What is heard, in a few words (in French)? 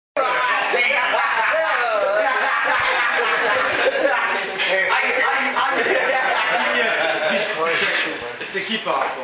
Rire; ROUMANIE 2011